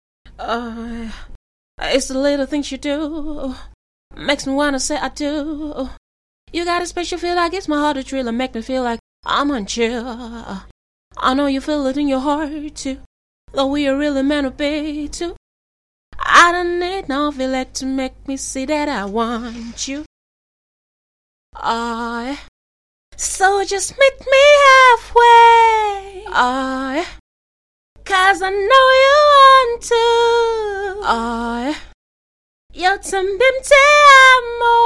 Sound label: pop rnb female 100 Gm